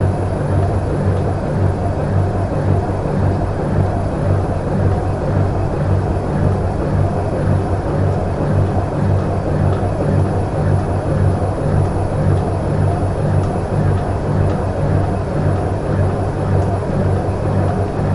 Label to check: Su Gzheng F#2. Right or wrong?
Wrong